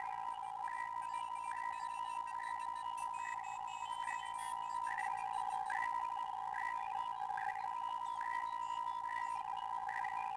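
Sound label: telemetry loop; Star Wars Obi-Wan; XBOX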